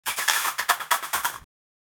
bass

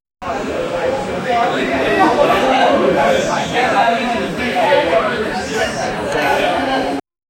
ambient bar sound

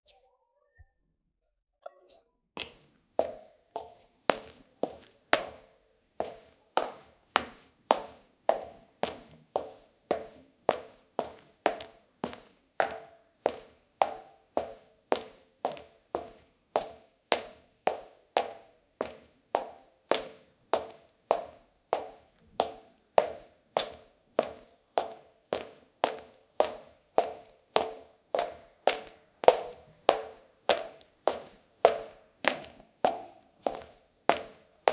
the sound of walking in, clack

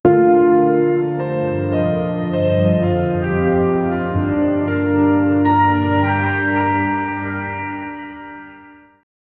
emotional japanese tv ballad

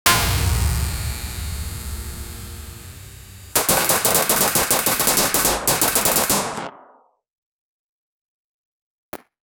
cyberpunk gunfight at nig; energy burst

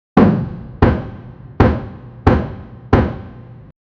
a building sound like ham; solid

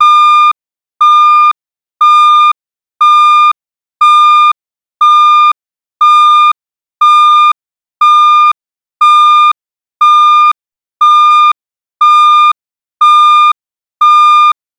atomic station safety ala, safety

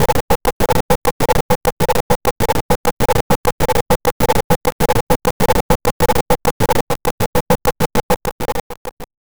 cool glitchy beat, 8-bit

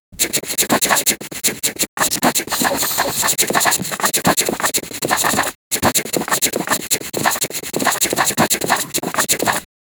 contemporary city sound; news

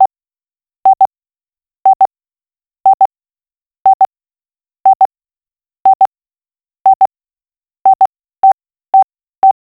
car parking aid sensor, boop

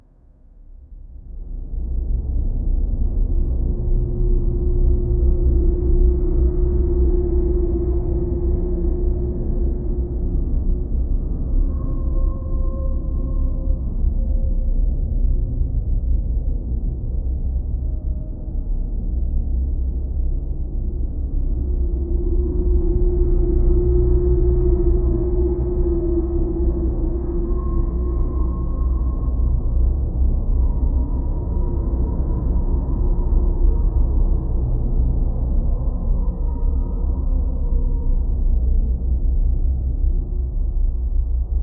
a dark ambient score with; sound effect

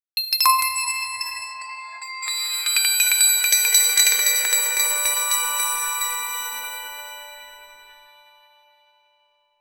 a long retro 8 bit 16 bit

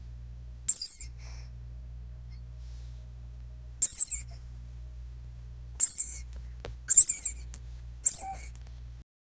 very small baby bear cub

